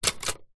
office typewriter key press typebar front close to key vintage 1960; lemair helvetia